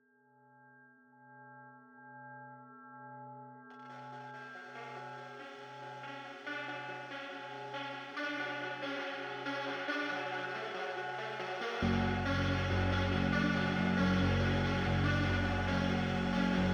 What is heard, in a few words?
MUS MENU loop; SGDA